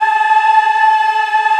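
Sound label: A4 men women eh, Choir; USB Soundscan vol.28; AKAI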